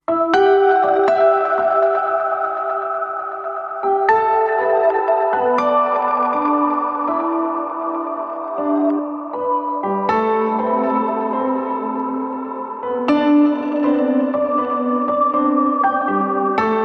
Swing Tone

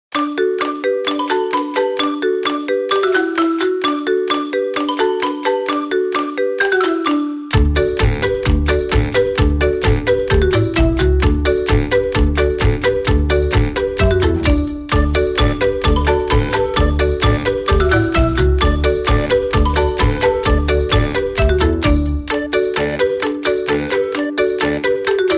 Alternate No Clicky Marimba

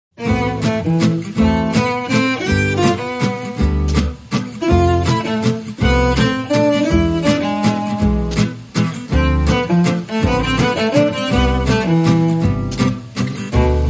Bal Musette Waltz, No Crowd

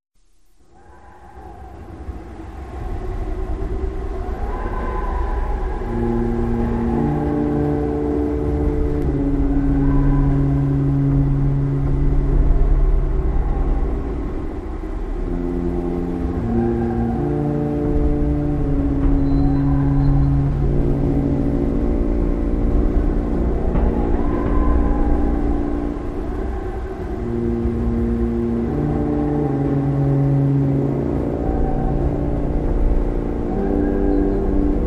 Orchestra

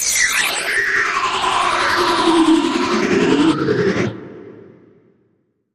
Alien Pulse

